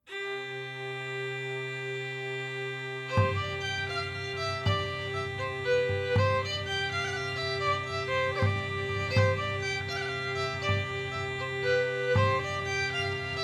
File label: Tavern; assassin s creed unity gamerip 2014